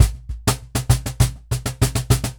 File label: Cajon Loop 100BPM; musicradar